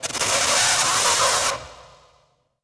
Zap Spit, electrical sparks

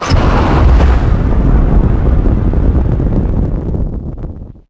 Oven fire burst; cold steel

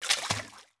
FS Water, footsteps

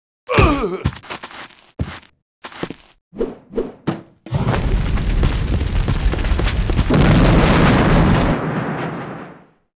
duel outro amb win; Sid Meiers Pirates; XBOX

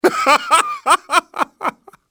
laughs; Soldier Of Fortune II Double Helix; XBOX; guard

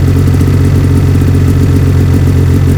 idle, sled; WhiteOut

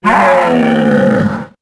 Roar, Dino; Anky

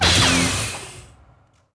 lightsaber wall; weapons

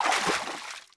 FS Water Deep Wade, footsteps

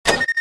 switch; Star Wars Jedi Knight II Jedi Outcast; XBOX; switches

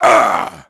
effort; HADEAN; Pulse Racer

